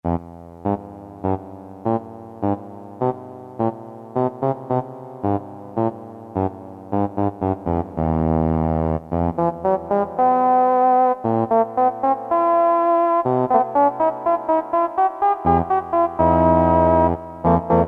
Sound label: double reed, Casio MT-500; Tones